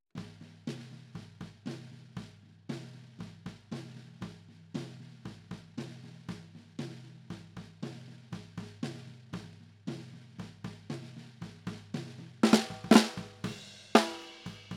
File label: Snare Bottom